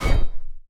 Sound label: Hammer